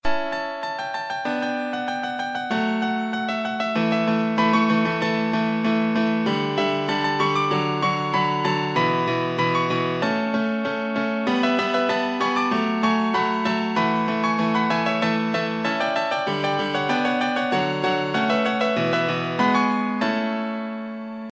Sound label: Piano Intro